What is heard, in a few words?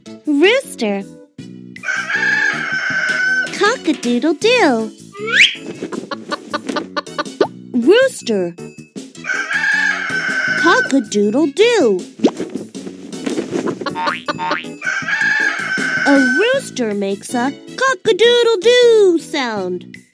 rooster